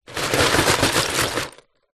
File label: nature ice pieces small hundreds shake in plastic container